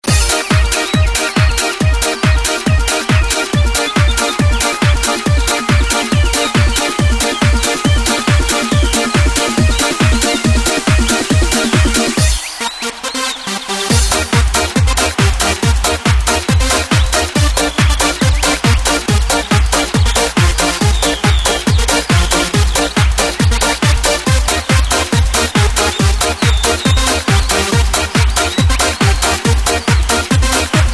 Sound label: Bird dance